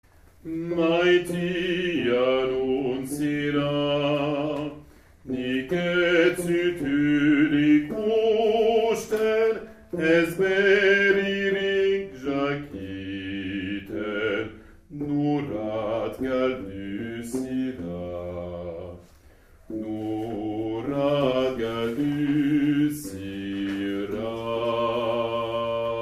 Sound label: Basses; Maïtia nun zira